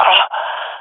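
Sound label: death, swat female; vo; Counter Strike Model Pack; CS:GO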